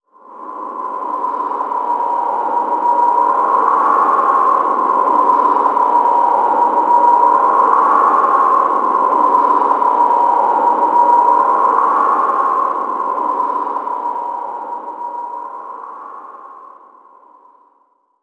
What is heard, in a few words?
environment wind stinger